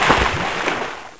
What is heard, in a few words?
splash; Ferazel's Wand Demo